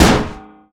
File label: Block; 100rogues